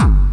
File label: obnoxious kick; Kicks